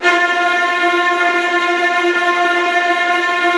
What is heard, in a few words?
STR TREMOLO, Strings